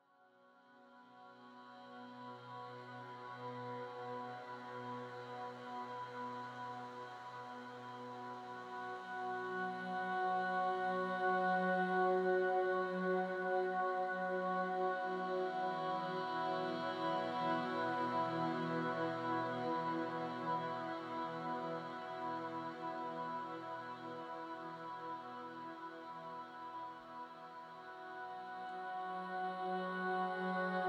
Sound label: Exhale Consonant Version